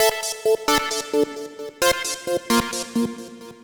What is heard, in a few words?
Lead Synth; Dance; Synths Lead